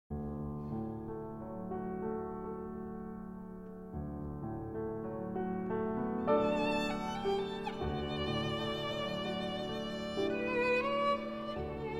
Rhapsody on a Theme of Paganini, For Violin and Piano; F. Kreisler